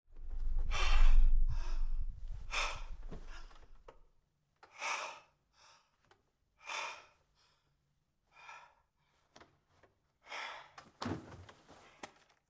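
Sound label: Rapid breathing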